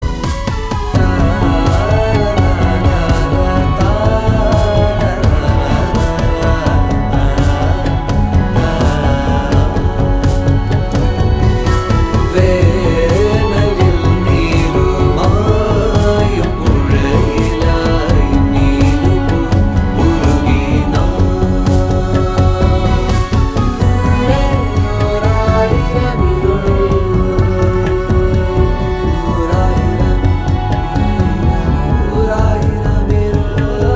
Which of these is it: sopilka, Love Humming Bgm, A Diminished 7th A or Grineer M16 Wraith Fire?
Love Humming Bgm